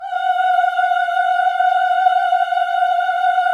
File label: VIB F F#5B-L, Symphony of Voices; Spectrasonics